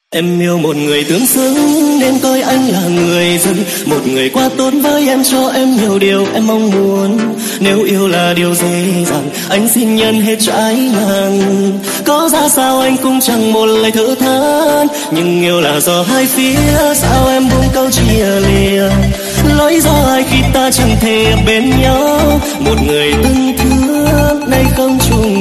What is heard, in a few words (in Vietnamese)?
Nhac Chuong Remix